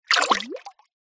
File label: multimedia game designed water movement